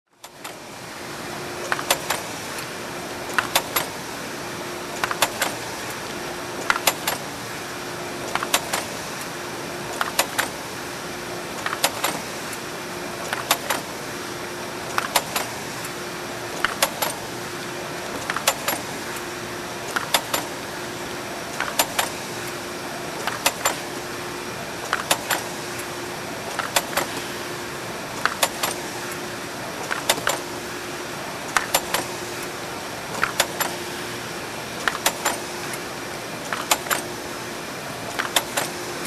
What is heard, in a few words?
Industrial Engine clank up-close